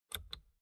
Car Classic Bentley Eight Dashboard Electric Window Controls Lock On; Transport; Car Sound Effects